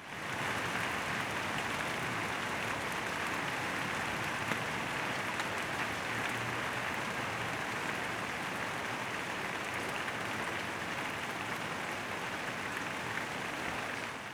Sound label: Rain